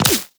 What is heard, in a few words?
Laser Impact Flesh; Warframe; Bullet Impacts